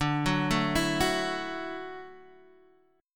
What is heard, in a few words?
Gb Minor 7th Sharp 5th, Guitar